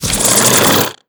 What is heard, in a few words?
attack growl, headcrab; creatures; vox